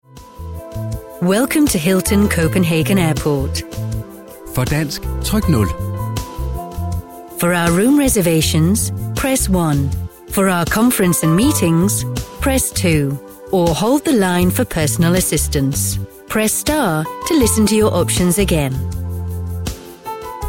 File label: Phone Music; Phone Demo; HiltonCopenhagenAirport